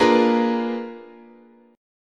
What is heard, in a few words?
Bb 7th Flat 9th, guitar chord